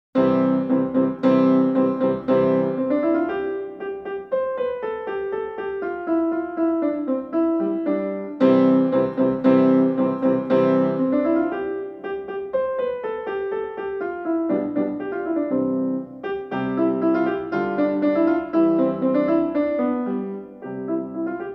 Festive Sonatina Allegro piano

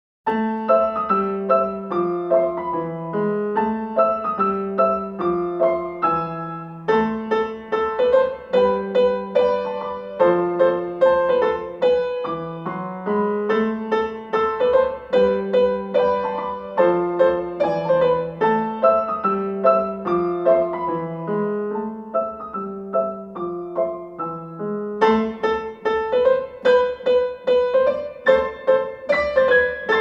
piano

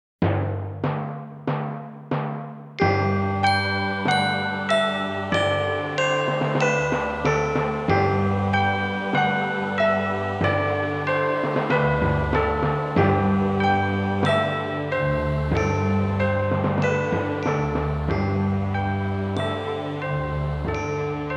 Bells On A G Scale Slow

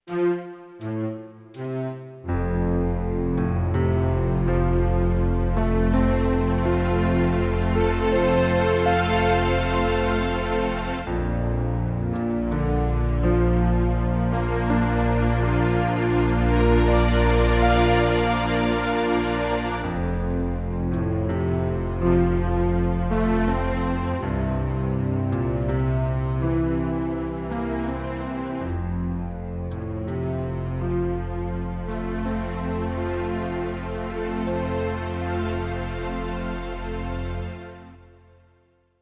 Dotted Quarter Arpeggios Slow

